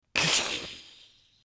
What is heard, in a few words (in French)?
parpaing qui tape un autre parpaing; mouth-fishing